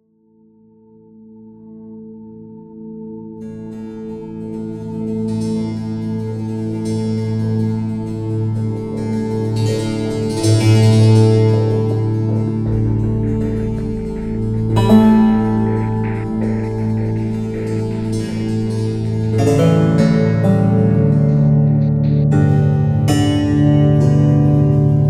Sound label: monochord